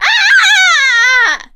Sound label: headshot death, witch voice; die